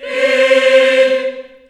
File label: C4, Choir